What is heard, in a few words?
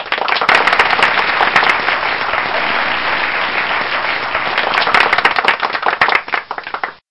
long clapping; kids-tetris; Haunted